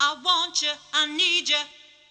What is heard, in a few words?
Sing Voice Want Ya; Choir